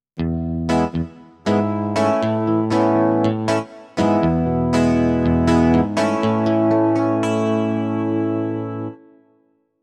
son eguitar, korg m50

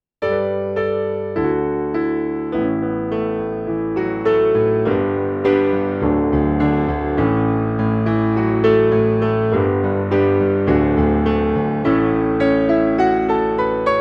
pc361 audio piano acoustique; kurzweil pc361

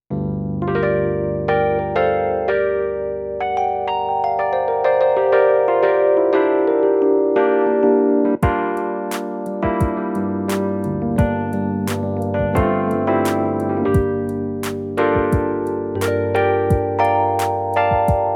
M50 son rhodes; korg

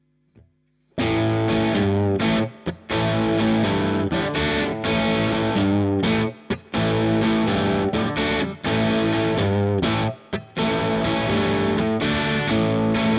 sat sans rien chevalet; bogner alchemist

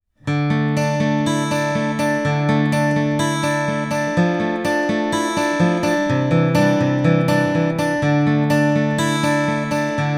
sony arpeges; m-audio sputnik

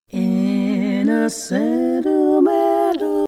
choeur; celemony melodyne

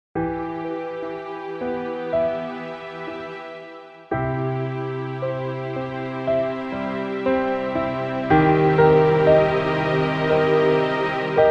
cordes; best service galaxy piano 2

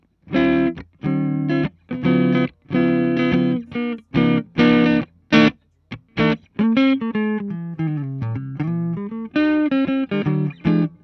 les paul clair; egnater

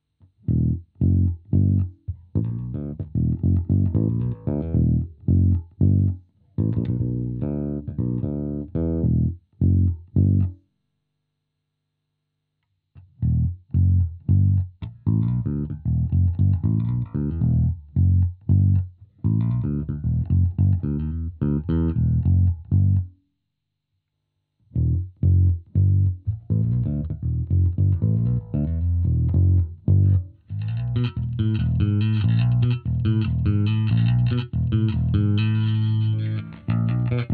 doigts, bass; fender roadworn

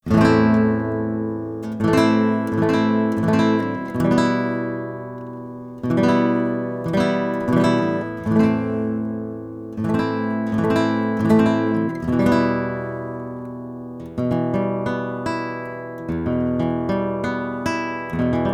statique flat notes, micro; smpro

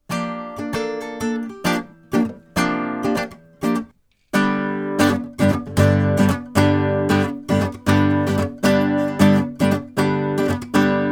statique flat accords, Micro; smpro

